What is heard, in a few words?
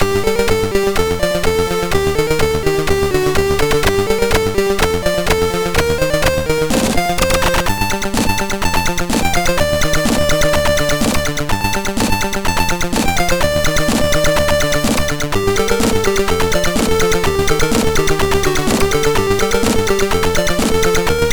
alternative drums, MOS6581R4; Arkanoid